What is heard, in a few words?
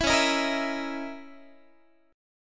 Db Minor 6th Add 9th Db down Guitar; strummed